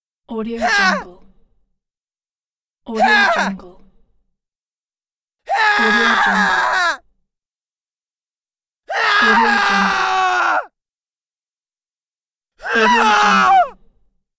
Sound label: male screams